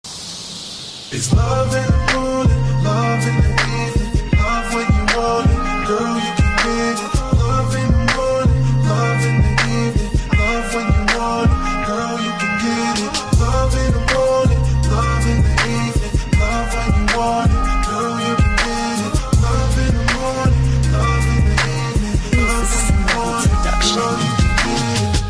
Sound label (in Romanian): Pop Manea